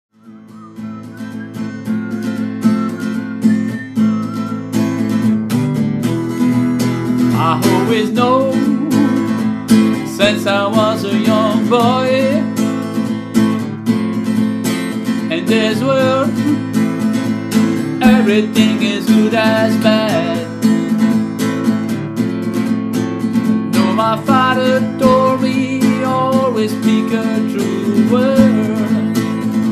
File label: hobo accoustic